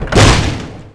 bus hatch close; auto; Tony Hawk's Project 8; XBOX

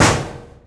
mtlhit

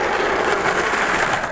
board rollin, skater; Tony Hawk's Project 8; XBOX

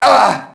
mobster get hit; Max Payne

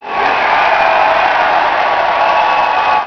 crowd cheer; Chase Hollywood Stunt Driver; XBOX

